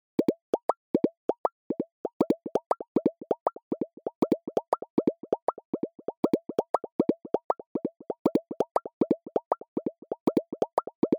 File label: Bubble Beat 119bpm